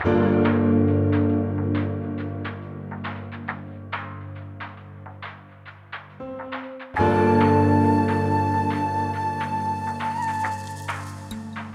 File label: CALM music